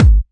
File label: Kick, Drum Hits